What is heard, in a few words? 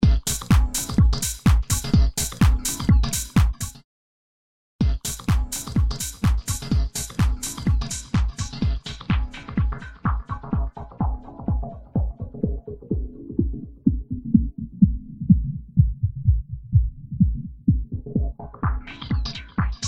input house